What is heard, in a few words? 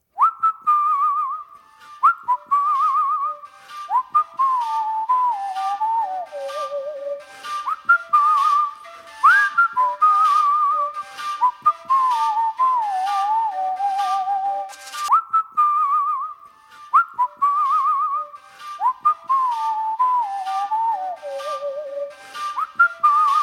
Whistle Tone Ringtone; Barfi